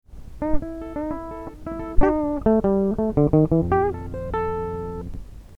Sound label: chicken picking solo